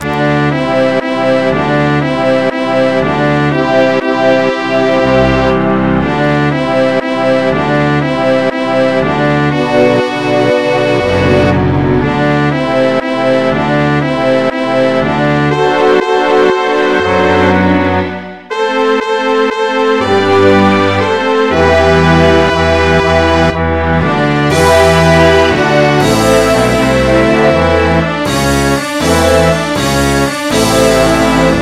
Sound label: New Mexico bilingual, MIDI